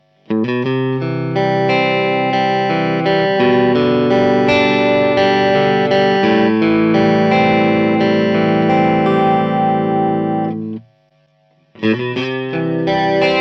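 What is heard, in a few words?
zoom g3x chorus reverb delay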